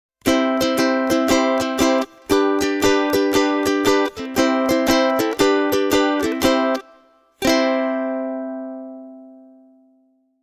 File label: concert ukes w pus ortega rudawn ce pu